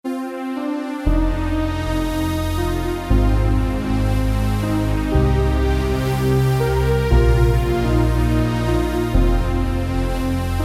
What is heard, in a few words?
Pads; Epica